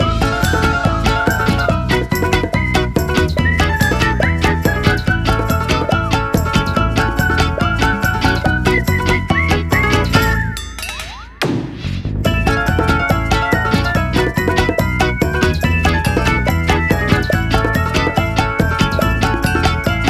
Cartoony Loop; ABC Wipeout Create and Crash; Wii U

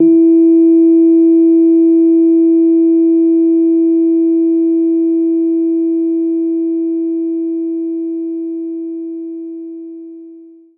Rhodes E3; knights try

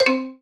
Success Xylophone Two Note Decline Low Dry stereo; linux macos switch windows gamerip 2019

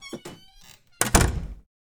Door Wooden Close

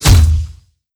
gun grenade launcher

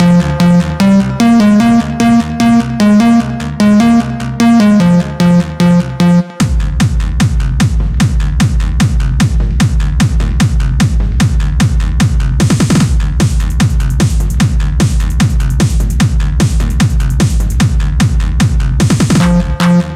GAMEPLAY THEME LOOP; cathunterz; Spacecats with Lasers

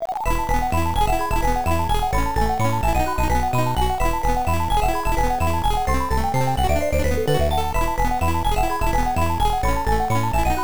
Dispensing Medals; Fishing Master

